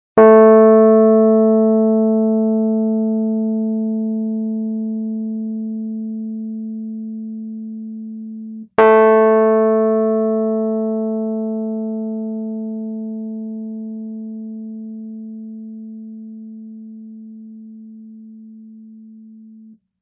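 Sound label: aa keyboard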